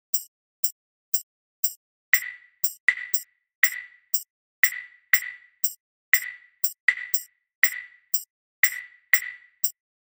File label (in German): clave Puls